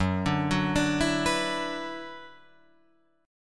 Gb-7th Suspended 4th Sharp 5th-Gb-6,9,6,9,7,6-8-down-Guitar-Open F; arpeggiated; JGuitar